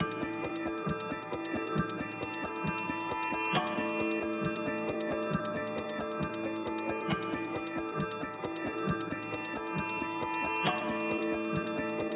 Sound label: Growing Tension Underscore; Narrative Mind